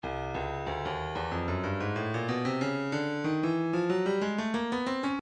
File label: breakbeat 093 bpm; float sting b; Stylus Breakbeat Midi Files